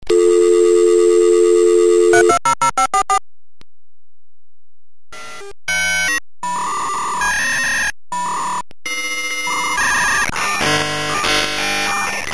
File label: k56flex, Modem Sounds; sm56; aol